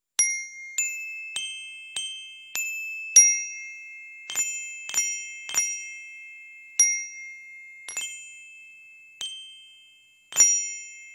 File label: glockenspiel; گلوکن اشپیل